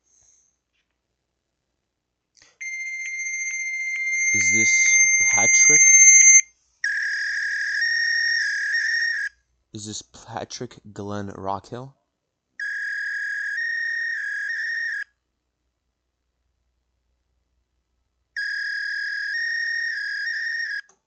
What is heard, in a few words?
Modem